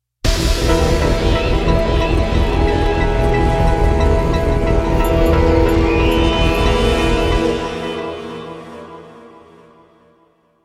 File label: Defeat Music; Avarice